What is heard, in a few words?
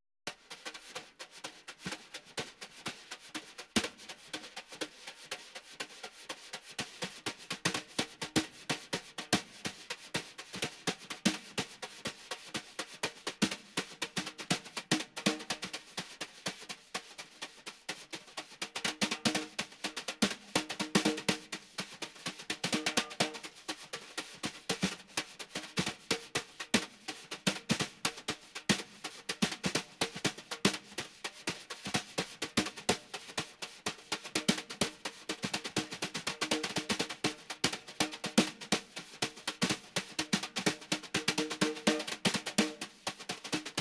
Snowy Muted Snare; xbox 360; gamerip; Adventures of Tintin The Game The Secret of the Unicorn